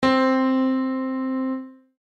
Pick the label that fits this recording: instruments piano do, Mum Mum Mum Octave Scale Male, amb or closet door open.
instruments piano do